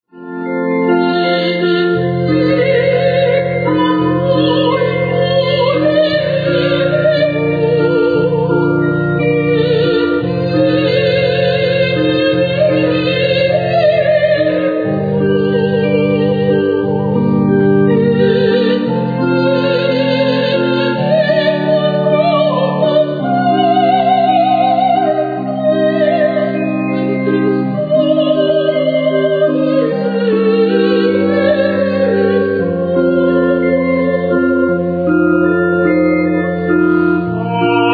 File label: avemaria; coros solistas; schubert